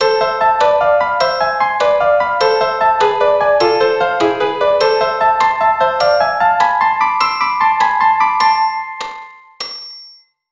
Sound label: Am harmonic